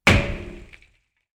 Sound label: small bang